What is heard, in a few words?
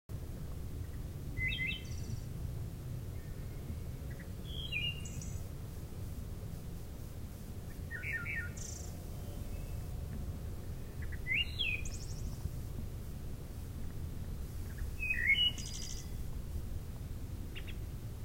Wood Thrush; birds; Indian Springs; The Birds of Indian Springs; audio cassette tape